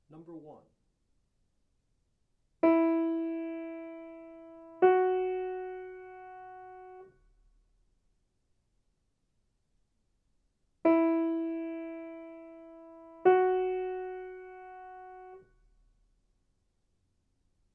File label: intervals; eartraining